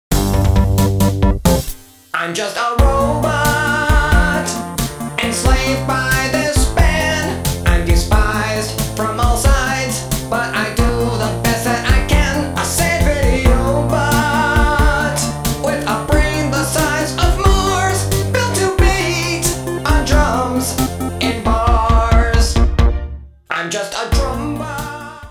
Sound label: drumbot